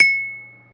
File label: bling